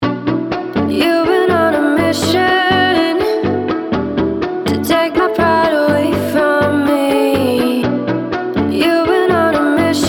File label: EDM; AIR